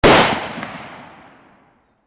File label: warfare gunshot rifle exterior; Rifles; Rifle Gunshot (Exterior) 1; McKinney Sound